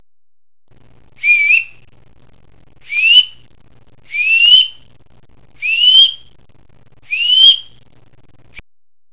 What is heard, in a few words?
whistles